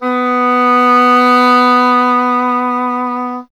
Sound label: E. Horn